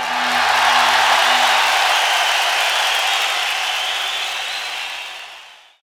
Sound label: people, live drums; virtual drum sounds